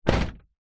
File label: vehicles truck door close internal